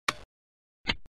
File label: switch